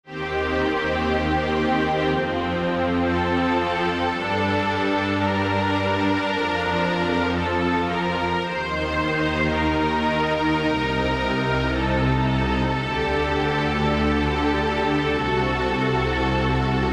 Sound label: Big Strings; Sonic Reality Modern Songwriter